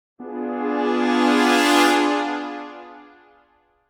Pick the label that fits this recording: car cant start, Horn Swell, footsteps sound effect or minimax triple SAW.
Horn Swell